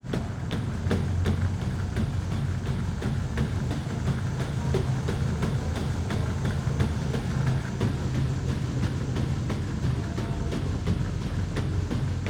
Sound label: At the Gym Treadmill Running Footsteps Rumbling; Marcello Del Monaco